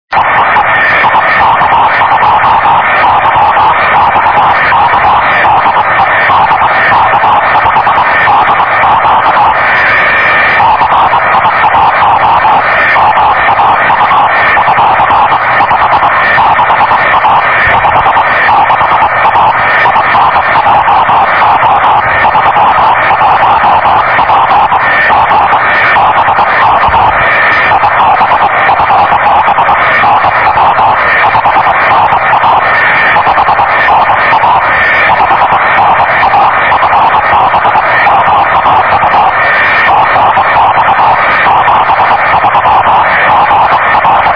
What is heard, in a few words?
cw ssb